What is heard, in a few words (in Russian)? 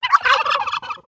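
индюк индюшка короткий звук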